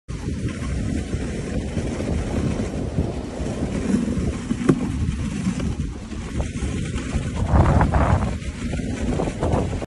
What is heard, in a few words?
sled